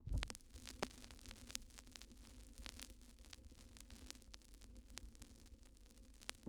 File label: Vinyl Crackles